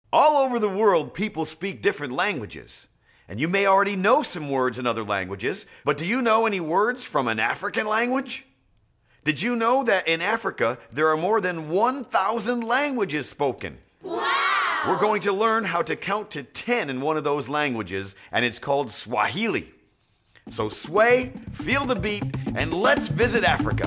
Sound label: swahili counting song